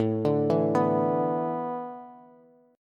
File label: A Suspended 2nd Suspended 4th, Bass; Asus2sus4 arpeggiated